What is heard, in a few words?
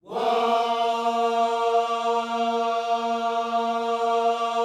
WHOA A#3, Vocal Planet Gospel; Spectrasonics; Vocal Planet Vol.1 Gospel; akai